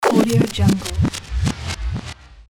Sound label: dj stop scratching